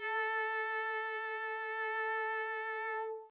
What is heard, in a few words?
cello; vibrato